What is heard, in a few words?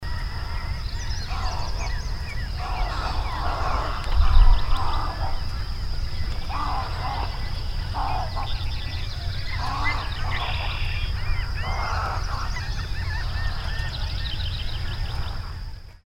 Snowy Egret; Worcester MD